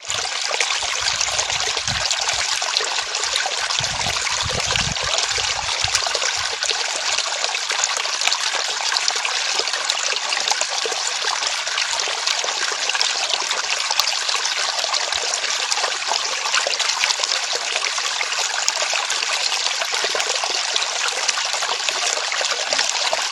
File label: water; OpenValley